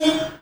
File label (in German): hupe aus, volvo 9900; Vehicles; AI volvo 9900; Freyfurt